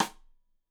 Side Stick Stereo; Deeply Sampled Drums

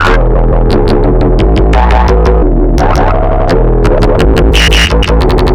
Synth, Drum n Bass; KJ Sawka; Drum n Bass & Beyond

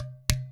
INDIAN DR MF6, INDIAN PERC; Spectrasonics - Heart of Asia CD2

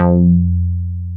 SH101; OLD ROLNDS

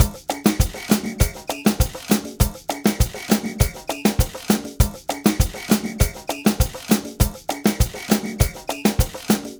Ensemble, Latin Percussion

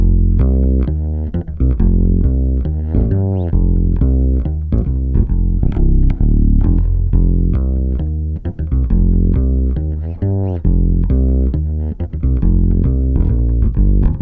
WALK, Bass Grooves; USB Soundscan vol.30; AKAI

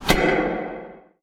Broom Broom, Industrial Hits; Antidote Advent

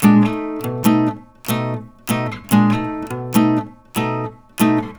RIFFS, Blues Guitar; ILIO; Fretworks

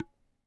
"Ne", tabla; Mango Loops

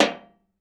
Foley Mid, Drum Bits, Sweeteners

